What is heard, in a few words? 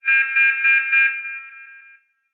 alarm beeps